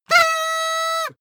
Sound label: paper hoot loud